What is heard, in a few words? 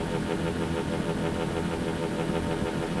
stun rifle idle